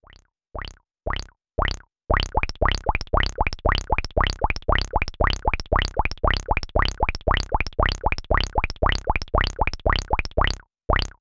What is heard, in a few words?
Res Sub Saw